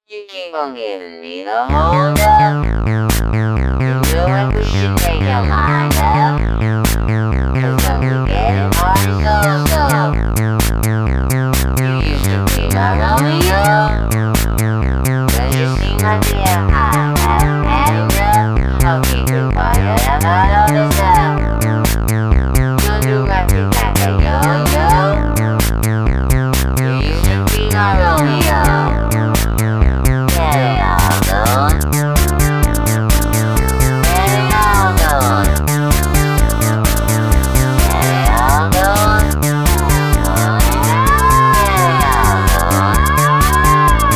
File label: Electro Pop Remix